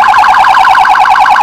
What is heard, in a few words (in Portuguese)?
sirene